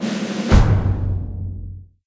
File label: trade failure